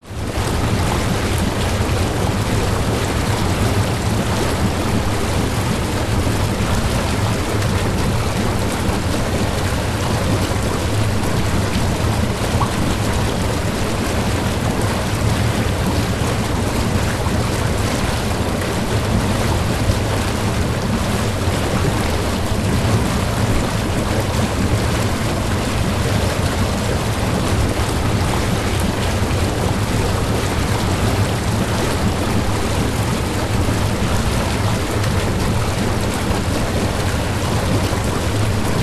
public places spa jacuzzi water bubbles 4m distance; zapsplat